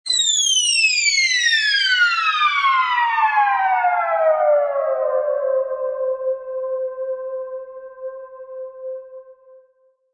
Ultra High Dive, High diving sound.; Rises and Dives; Sound Design

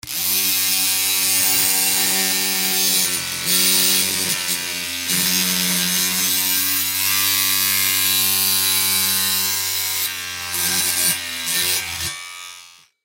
Dremel Grind Metal Electric Power; Machines; Blastwave

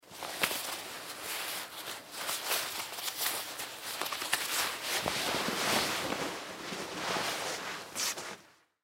Coat Unbutton Remove Vinyl; Dressing; Foley; Human; Blastwave

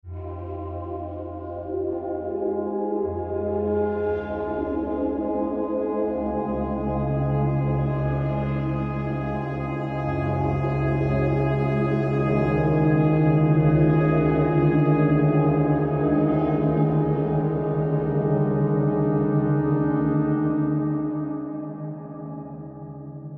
Horror Crescendo Swell; Blastwave

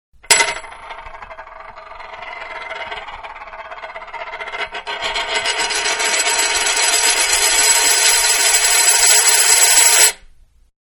Half Dollar Spun On Glass, Coin; Money (Sound Effects:Objects); Manhattan Production Music

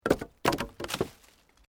Wood Logs Movement Major; Material components for constructing more complex sounds; Materials

